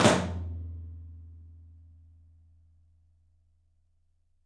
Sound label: OPEN TOM; 3D Sound; 3D Drums